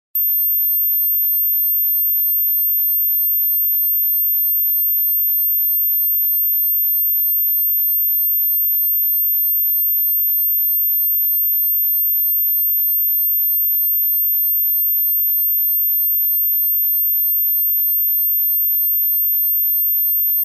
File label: Ringing Tone